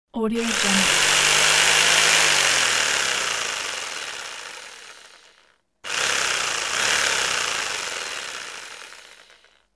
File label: fretsaw idle running